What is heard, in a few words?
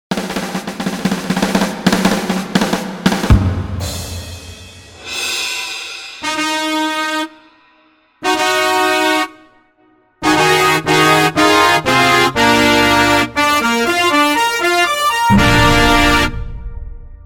Fanfare; Paganini Fanfare; besondere Fanfaren